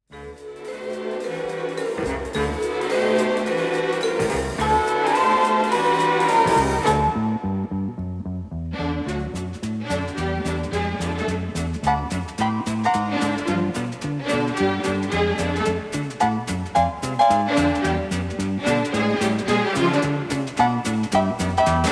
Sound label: Orchestra